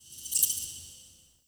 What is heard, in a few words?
Witch Station Ruins Ghost Rat Chatter, Ambience; Soul Plane